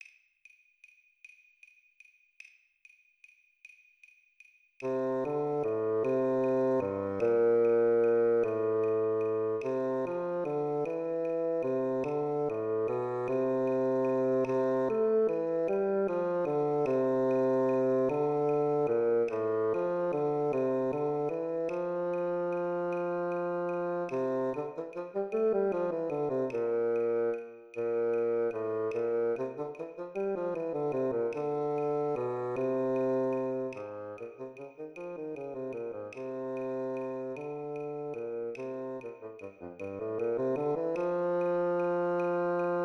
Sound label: Bass Clarinet Play Along 50 BPM